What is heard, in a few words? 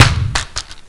zombie bomb bounce; left4dead2